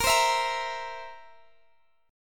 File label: A sharp Suspended 2nd Flat 5th A sharp, Guitar, Drop D